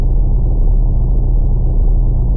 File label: engine; stunt rally